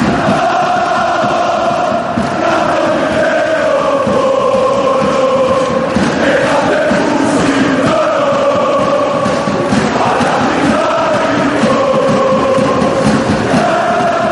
pao gamo te leophoro fanchants

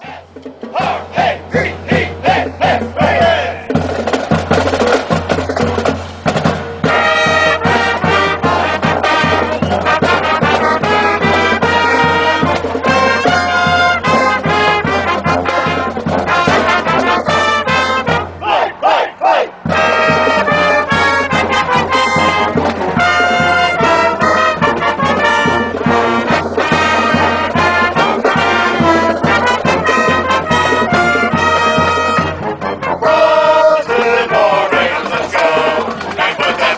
baltimore fight song, chants

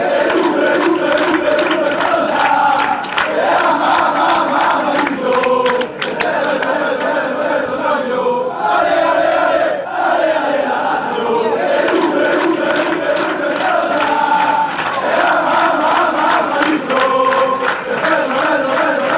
ole ale ole ole, chants; lazio

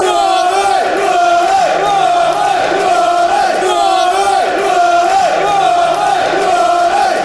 blue army, fan chants; sufc

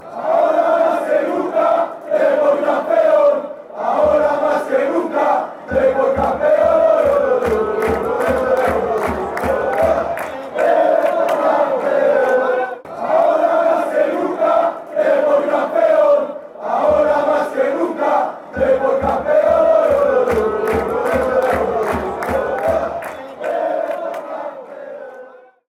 now, chants; Real Club Deportivo de La Coruña is from the city of A Coruña, Galicia

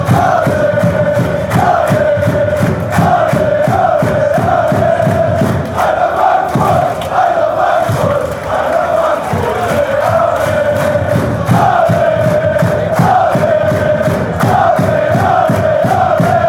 allez, fan chants

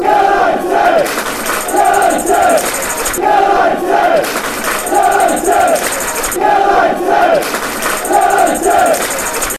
united clap; dufc

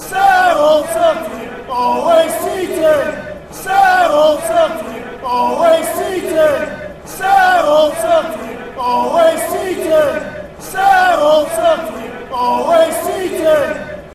same old, chants; celtic

